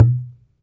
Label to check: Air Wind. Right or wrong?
Wrong